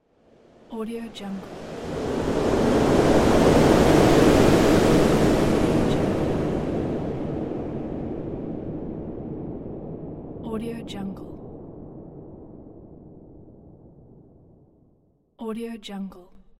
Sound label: turbulent offshore ambience